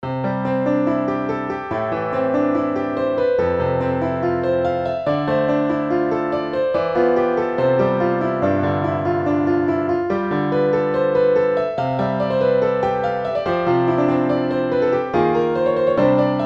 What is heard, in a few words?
sonata piano